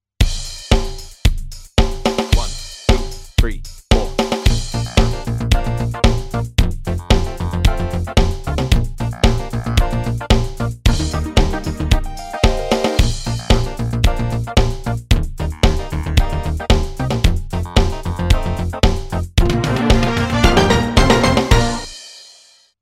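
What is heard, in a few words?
Tongue Test Bb @112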